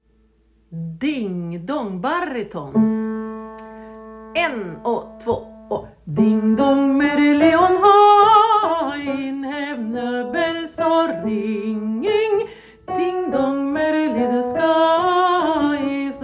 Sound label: Ding dong Bariton